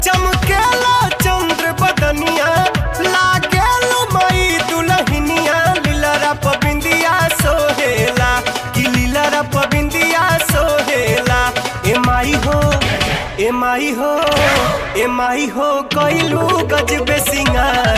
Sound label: BHOJPURI RINGTONE